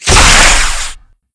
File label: rocket launch; wartso